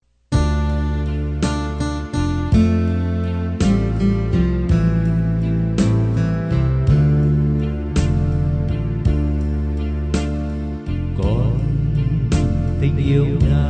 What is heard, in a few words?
bass